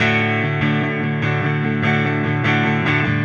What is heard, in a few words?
Silos Clean Strum Guitar, Indie Rock; Sonic Foundry (Sony Creative Software); Indie Rock Anthems; Session 11 - Silos